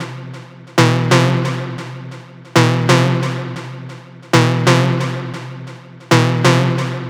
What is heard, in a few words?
Synth Loop E 135, Techno; Ghosthack; AC2024 - Day 1 - Techno Sounds 2025